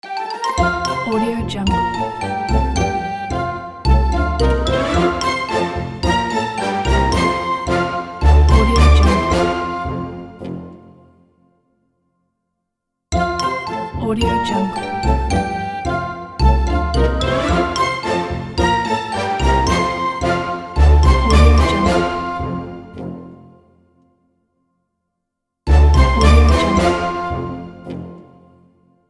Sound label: funny kids game logo